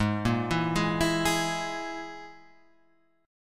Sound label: G sharp Suspended 2nd Sharp 5th G sharp, guitar; x,x,6,3,4,3,6,5